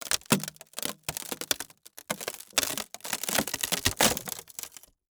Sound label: Wood Break